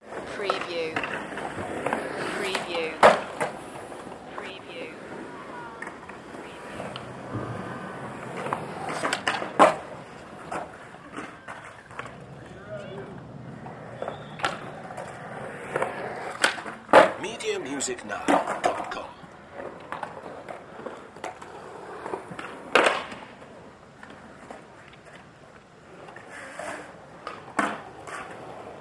Skate Park

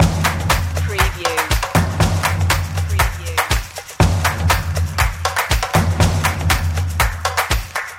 energy drums loop; stomping

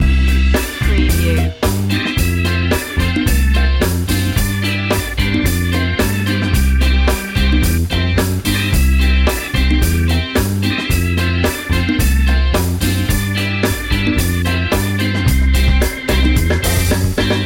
beach fun loop, caribbean; world influences

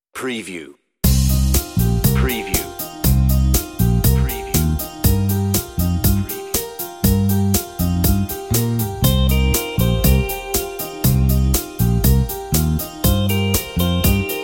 Break Away, on-hold music

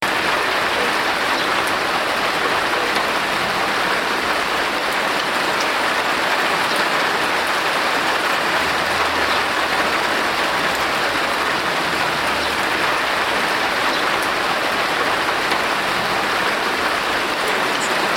Rain; outside

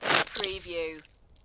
Snow and Ice, Ice scrape with ice fragments

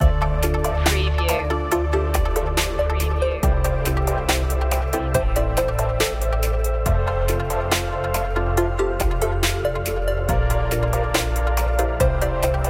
glamour downtempo; enchanting

